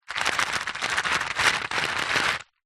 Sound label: foley stones many in plastic dish shake; zapsplat